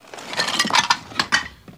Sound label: Table Moving Among G, debris